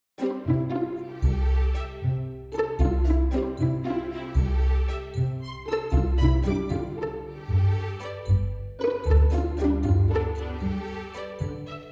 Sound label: Theme Orchestral